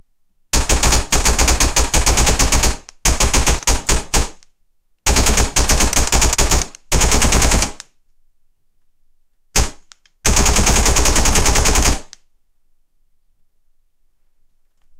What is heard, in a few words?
a mech that shoots with